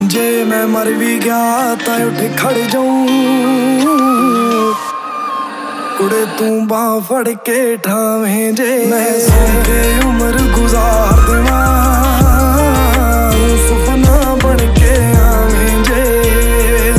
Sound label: Song Ringtone